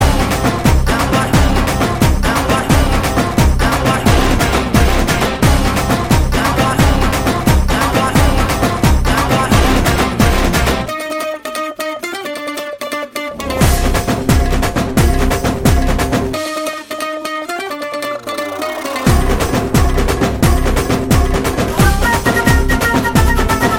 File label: Bgm Ringtone; Etharkkum Thunindhavan; Suriya